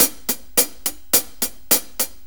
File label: 4-4 HiHat Loop; Sonic Foundry; Drum Tools